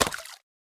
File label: Water Gun Impact